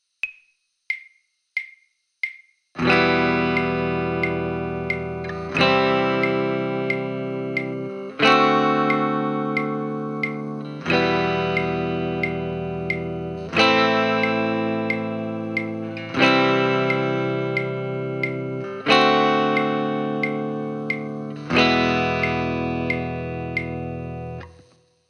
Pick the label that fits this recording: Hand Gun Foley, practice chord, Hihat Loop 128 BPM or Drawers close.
practice chord